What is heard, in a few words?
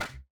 Attack Louder; Attacks